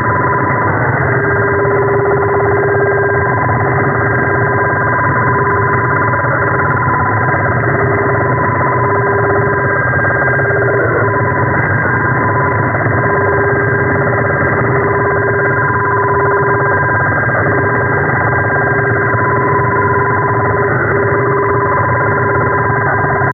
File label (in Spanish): PSK Multicanal. 12 canales a 120 Baudios. BW = 2K60E. Línea piloto a 3.300 Hz.